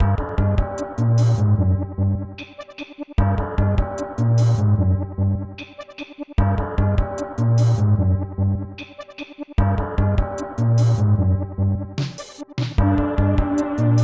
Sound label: Dungeon; Sword World SFC; SNES